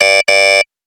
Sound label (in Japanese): クイズ 間違え HUMAN, japanese tv variety show sound effects